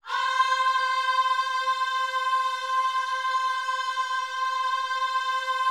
OHS C 5C, Gospel Ohs; Spectrasonics; Vocal Planet

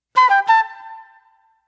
Soul Plate Flute

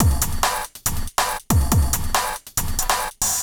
Beat Full, 140bpm, Grime; Zero-G Sound Sense Grime Scene; Construction Kits; Astoria

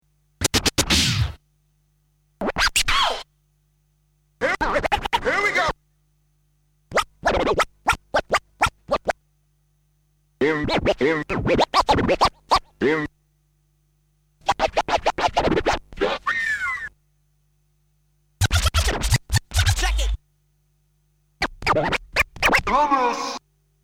Scratches; Kniteforce; 1000 Samples Volume 1

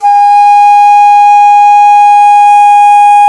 DIZI, Old World Instruments, Winds; E-MU Producer Series Vol. 7 – Old World Instruments

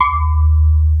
Electric Pianos; Wizoo Electric Pianos

